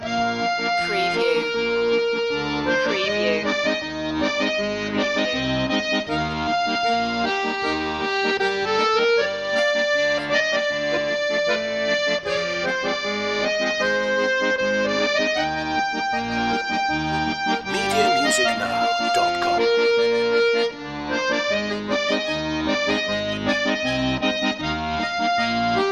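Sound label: cheerful accordion loop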